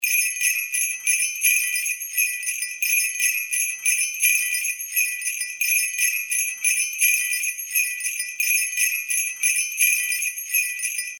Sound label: sleigh bells sound